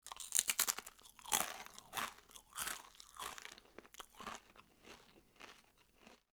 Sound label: crunching